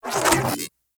Arch Gun Mode Switch Beam; Warframe; Weapons; Archwing; Hildryn

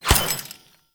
Glass Shatter Damage Hit Effect; Warframe; Warframes

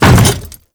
Hammer Boss Step Default, Footsteps

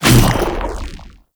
Shadow Mace Whoosh; Warframe; Weapons; Shadowgrapher

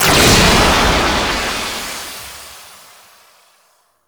Gravitational Instability Cast; Archwing

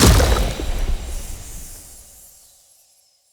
Stomp Impact; Powers; Fey; Champion

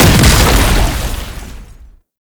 tank infested charge wall slam; Enemies; Abilities; Warframe; Lasrian Tank

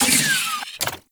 Charge Up Stop; Crp BFG

